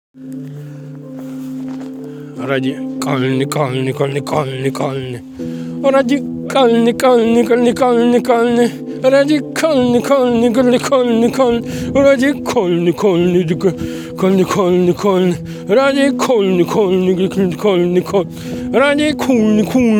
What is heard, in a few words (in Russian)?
спонтан. напев; ради-кальный; депрессия сгниёт, а душа будет жить вечно